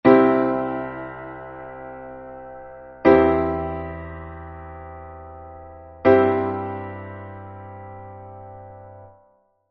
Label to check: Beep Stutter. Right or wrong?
Wrong